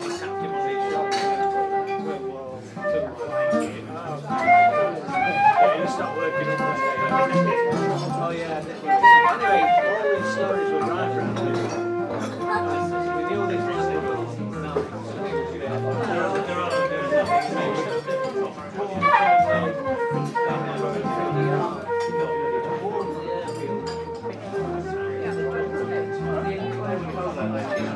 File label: jazz flute